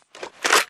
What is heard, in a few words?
wpn arl reload; Titanfall 2